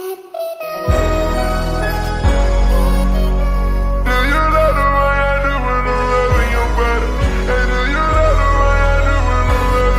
slowed reverb

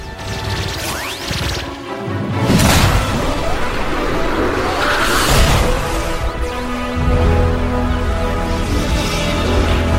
transformation sound; omnitrix; alien force

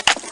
weapon pickup; counter strike

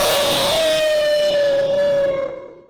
jumpscare sound; photo negative mickey

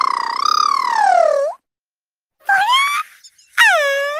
happy eevee noises

